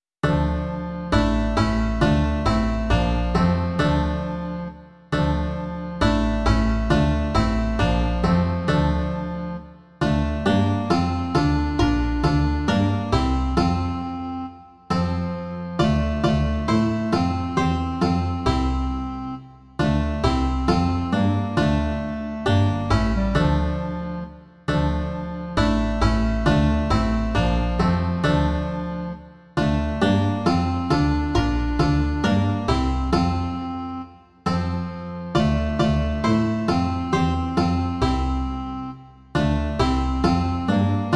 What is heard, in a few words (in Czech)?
banjo, klarinet a kontrabas; Radujme se vždy společně; Imaginativní verze